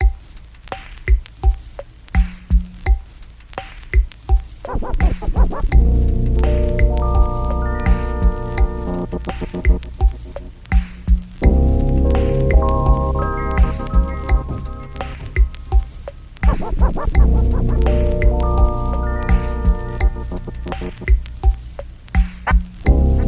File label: Sean Instrumental